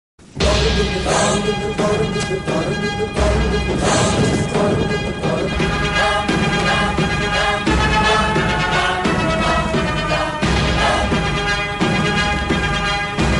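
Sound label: Telugu movie ringtone and bgm; gopichand; ramabanam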